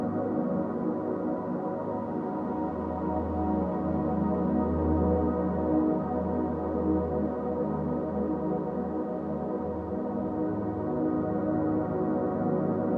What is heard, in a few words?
Sleep Songs